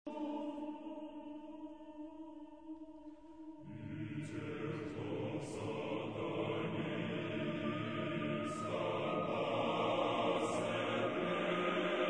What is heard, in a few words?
Schnittke Choir